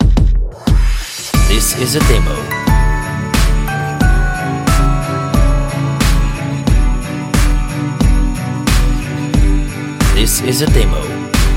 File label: Christmas Pop Mainstream pop xmas bells 91 bpm